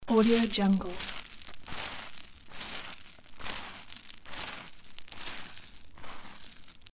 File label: walking in deep gravel loop